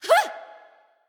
grunt jump; smite; nox

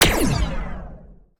basic fire; smite; Anhur

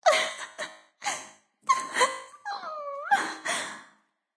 boohoo; smite; kali

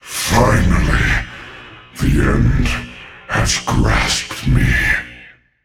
Death; smite; voicepack; jorm

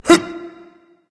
grunt jump berserk; cuchulainn

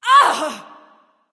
NV Ouch; smite; awilix

